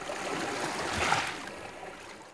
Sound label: shore, canals; hl2